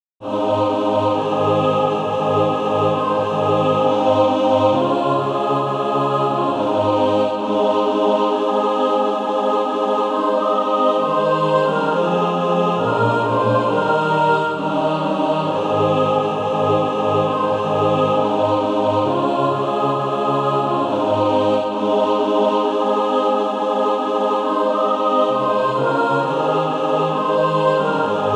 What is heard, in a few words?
mixed